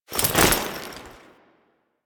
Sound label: Foley Weapon Swap; Warframe; Kahl